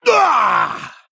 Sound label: Male Human Death